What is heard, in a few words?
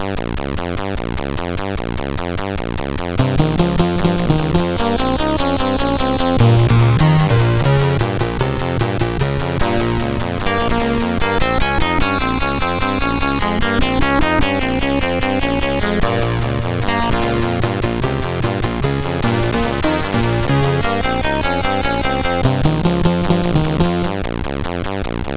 sid CSG8580R5; Shadowfire